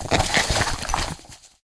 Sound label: inv helmet off; STALKER; IWP